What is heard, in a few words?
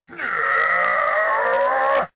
Big charge; RedDot